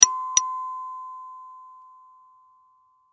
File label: foley double bell